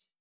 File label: chillhouse hihat; Electro